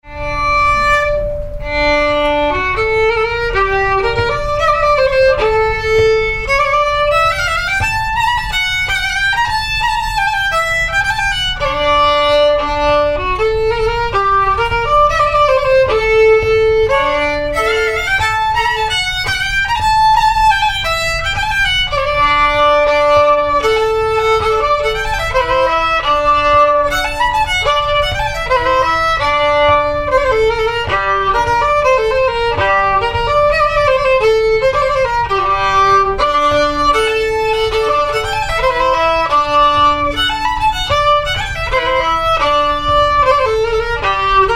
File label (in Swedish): Michola polskan